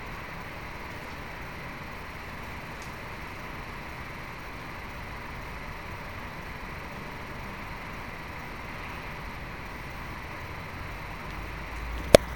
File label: ENGTX580 noise busy; ENGTX580DCIIreview